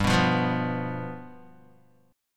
G 7th G, Guitar; G7 strummed

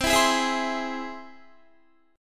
C Augmented, Guitar, chord; strummed

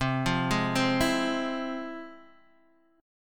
F Minor Major 7th, chord

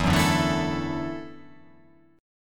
E 7th Suspended 2nd Suspended 4th, down

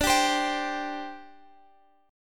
C Suspended 2nd D

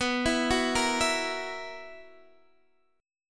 B Major 7th Suspended 4th B, Guitar, Standard; arpeggiated; x,14,14,11,11,12; JGuitar

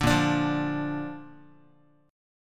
A sharp Major, Guitar; strummed; JGuitar

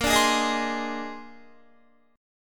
A sharp 11th, Guitar; strummed; JGuitar

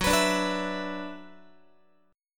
Gb 7th Suspended 4th Sharp 5th Gb Guitar; Gb7sus4#5 strummed

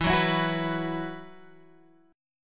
E Suspended 2nd E, down, Guitar, Open G

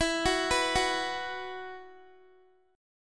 E Suspended 2nd, Guitar; Esus2; arpeggiated; x,19,18,16,18,x

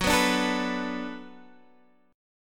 B Minor 7th F sharp, chord

